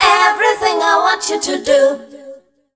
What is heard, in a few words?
FEMALE CHOIR, Dance Vocals